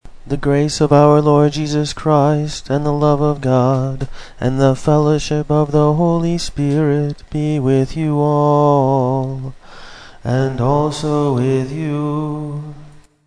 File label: Greeting, chant